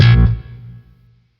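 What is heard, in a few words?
Sentient Shawzin Slap Octave One A Sharp; Chords; Warframe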